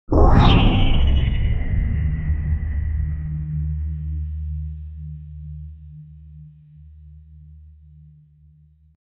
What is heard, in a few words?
dark arcane gravity activ